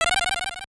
bounce on stick; ducktales

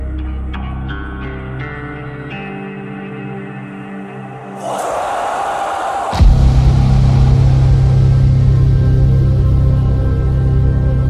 music; Doom Eternal; the betrayer

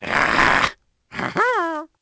growl; Where is my Water

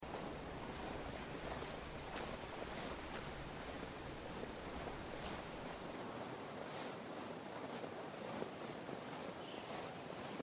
walking snow; Life is Strange 2; gamesoundopedia

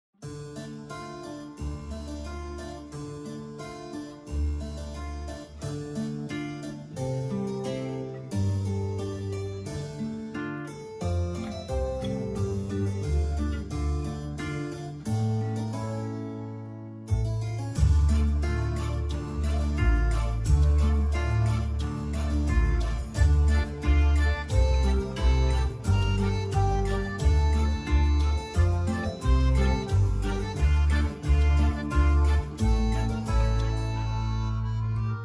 beast theme; divinity original sin ii

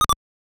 climb liane; ducktales

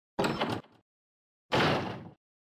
door open and close; baldursgate

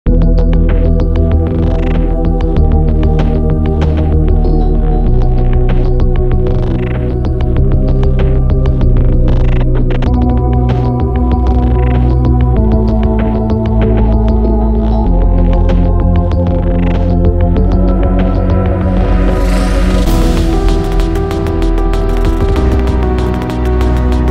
repentant caves; tboi

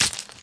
candy break; Cut the Rope

